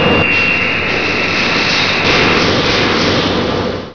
turret deploy

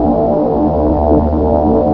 drone machine, ambience

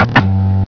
button

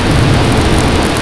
gar flame run

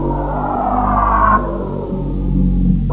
alien zonerator, ambience

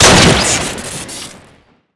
boltaction shoot crit; weapons

